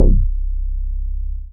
BAS MINI, Mini-Moog

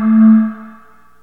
phlute (A); Sonic Foundry (Sony Creative Software); Ugly Remnants VOL-2

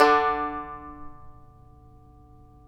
TAR; Roland - LA Composer vol. 3

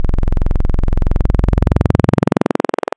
Hi Passer, wavetables; Waves Codex

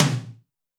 tom, Zoom MRT-3B

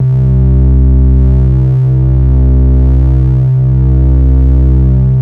C3, BASS; Q Up Arts; Dream Experience; BASS BANK 5

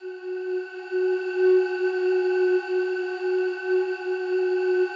slow strings F#3, Yamaha VP-1; Music Store; 90's Sample CDs

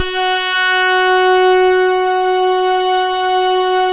KORG DELTA, LEADS

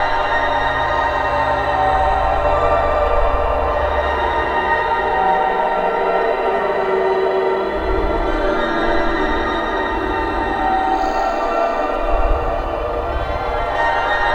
Surreal Guitar; Sonic Foundry (Sony Creative Software); Ma Ja Le Ethereal Textures

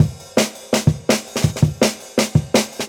Jungle Breaks

